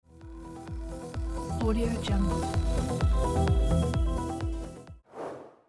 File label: high energy techno trance transition